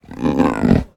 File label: Leopard Die; Idle Champions of the Forgotten Realms